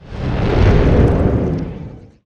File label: primal split cast; weapons; dota; brewmaster